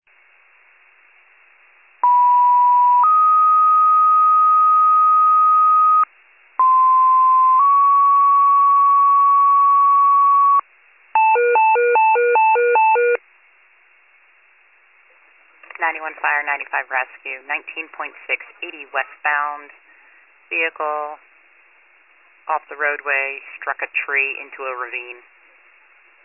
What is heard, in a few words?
fire, pager alert